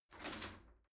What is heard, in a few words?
shower slide door slide short open